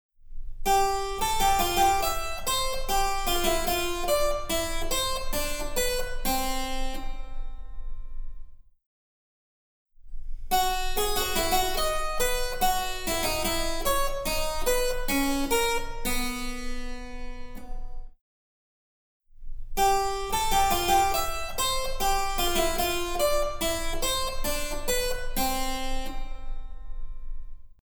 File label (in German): Cembalo; Mark Lindley WTC 1